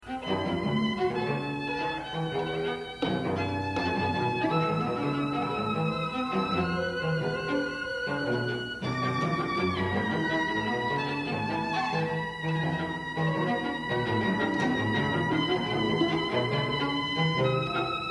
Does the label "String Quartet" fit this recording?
Yes